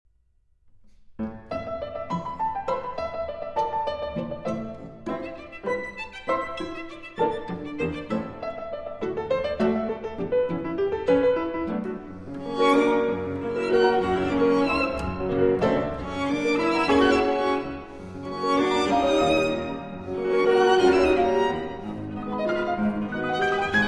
Piano Trio in A Minor, Pantoum. Assez Vif

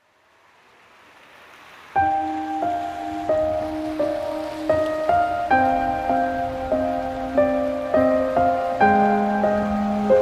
Piano and Rain

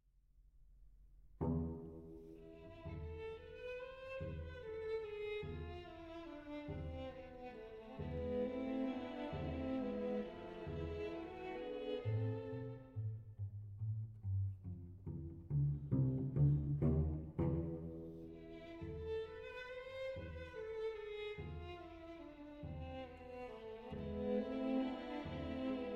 String Quartet in C Major, Andante Con Moto; Op. 59 No. 3; Rasumovsky No. 3

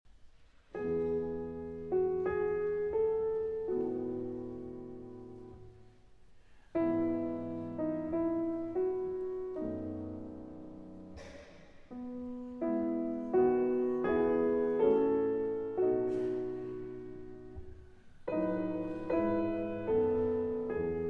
Piano Sonata No. 3 in C Major; Op. 2 No. 3; Beethoven

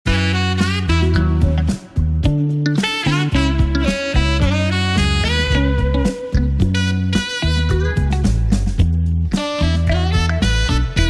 Saxophone

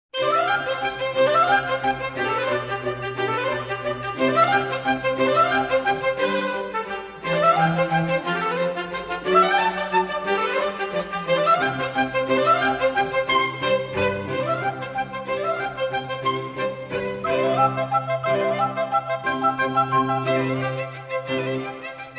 Recorder, Oboe and 2 Violins; Concerto; in C Major; III. Allegro Assai

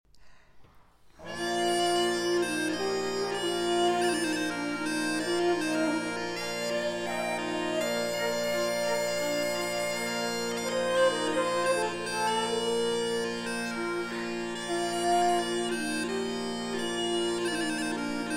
Trio For Musette, Flute and Violin, Musette; Divertissement Champêtre

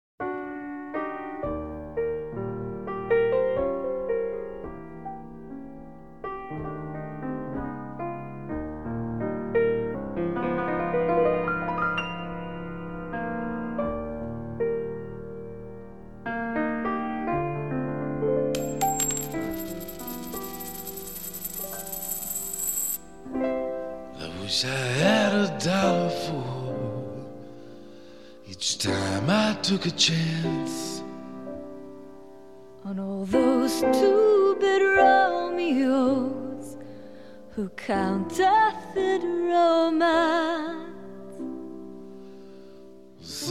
Piano Intro

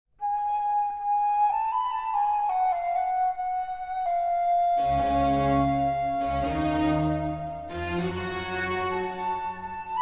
Concerto in F Major For Flute & Strings; La Tempesta di Mare; II. Largo

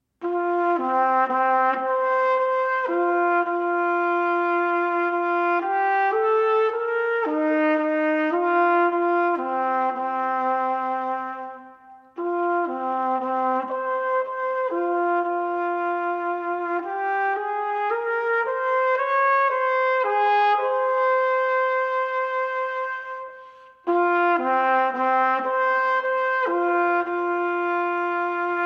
Unaccompanied Solo Trumpet, Ballad; Suite For Unaccompanied Solo Trumpet III.

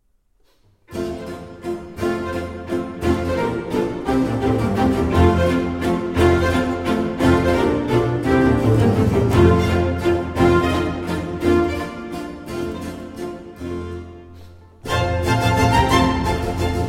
Violin Concerto in F Major Allegro

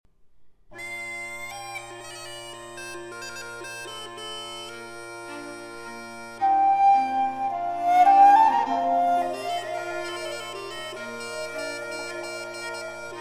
Trio For Musette, Flute and Violin, Lentement

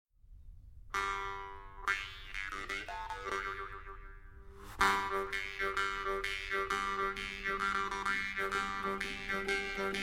Traditional Irish Tunes; The One-Horned Cow – Patsy Jouhey’s – Killavil Jig

